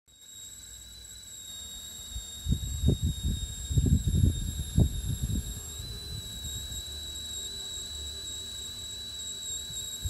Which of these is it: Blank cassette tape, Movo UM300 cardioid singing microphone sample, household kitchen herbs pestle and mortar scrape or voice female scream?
Blank cassette tape